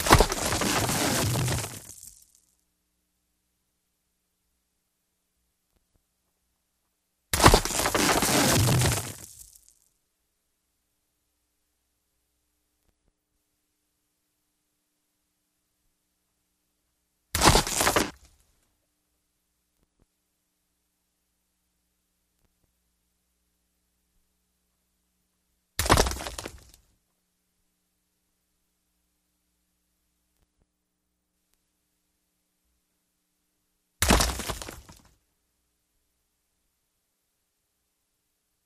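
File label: Rock Cracks Big Short, rocks & dirt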